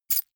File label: key ring large catch; objekte; schluessel